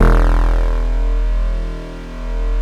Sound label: PERC BA